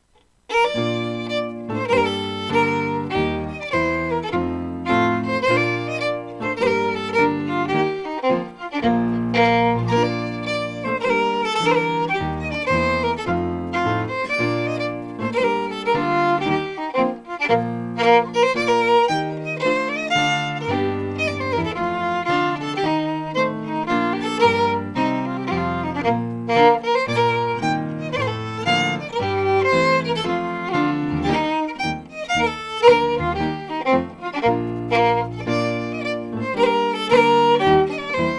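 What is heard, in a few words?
Strathspey, Scottish Fiddling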